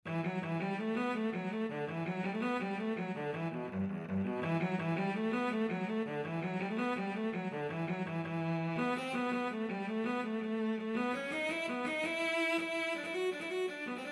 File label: cello